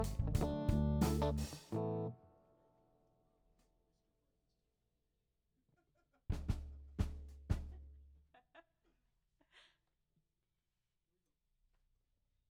Rough Mix L

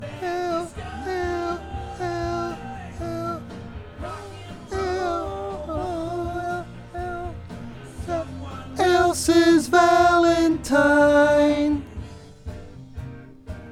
VOX Back

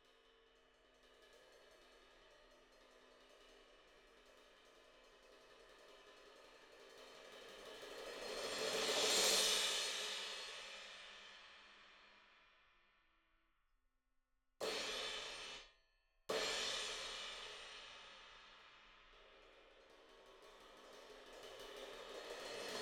Cymbal

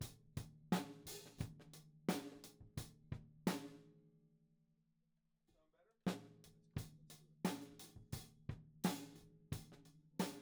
Tom1

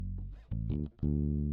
Bass DI

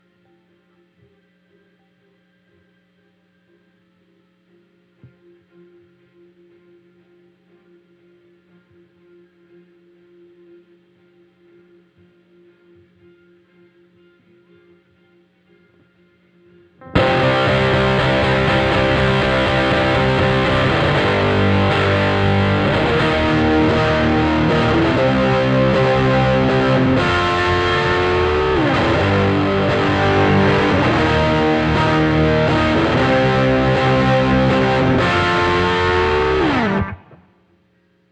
Guitar

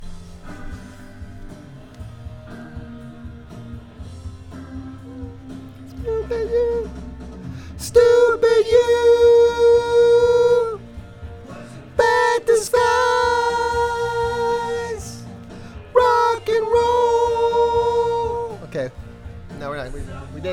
VOX Back

